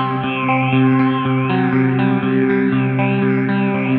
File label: Gretsch Ring 120 C, guitar drone; 120bpm